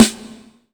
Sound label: Snare hi, Hip Hop; Akai MPC500